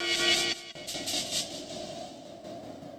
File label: Industrial 160